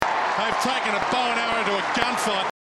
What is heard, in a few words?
gunfight